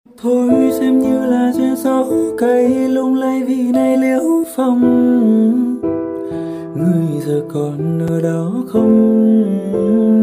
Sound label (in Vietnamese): nhac chuong Lofi